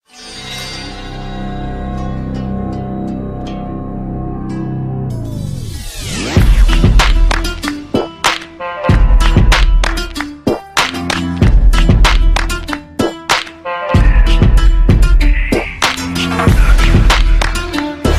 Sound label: arabic instrumental